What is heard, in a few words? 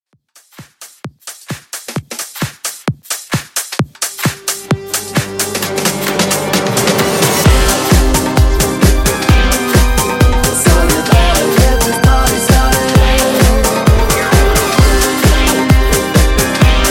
Club House Remix 131 Bpm Clean